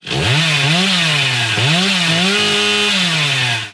Chainsaw; Hillbilly

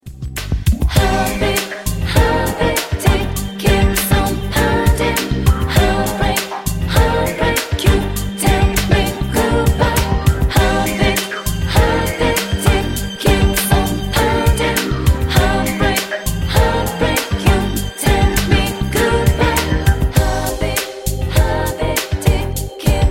Heartbeat Heartbeat

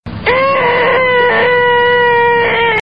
Most Annoying Sound

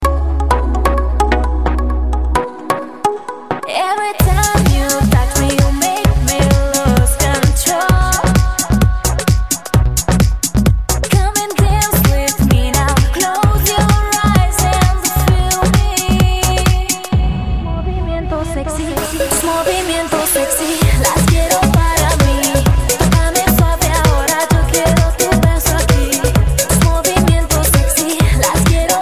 Dance house Ringtone

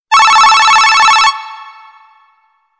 Cool Loop Alert

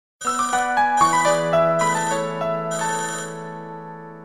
Nokia Tune

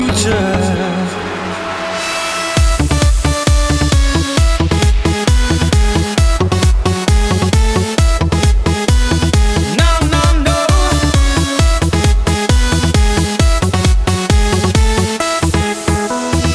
Disco

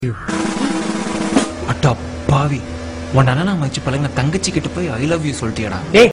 Funny punch

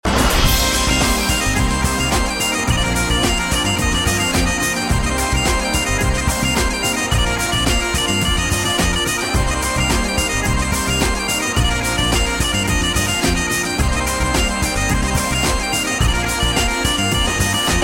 bagpipe

